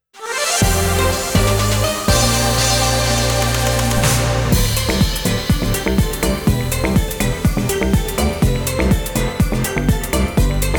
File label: Victory!; Tera Raid